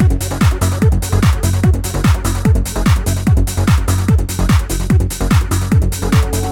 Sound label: bgm